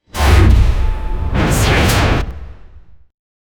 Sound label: machine